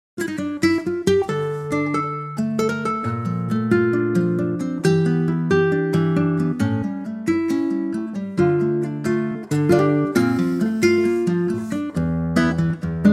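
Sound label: Dm latin guitar; key - Dm; Stunning acoustic guitar arrangement, with a gorgeous latin feel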